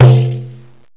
daire, indian perc; Octamed 6; walkabout

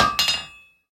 hammer; kult

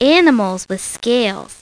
SCALES; My Science Fair

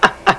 cackle; Sound Library 2000; Wayzata Technology

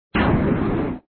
kids plode; operation graduates

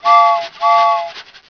ChooChoo

